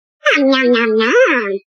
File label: Sms